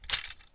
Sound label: Dropping Sword